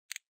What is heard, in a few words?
click